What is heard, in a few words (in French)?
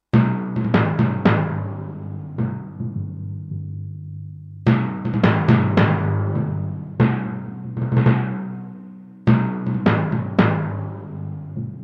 timbales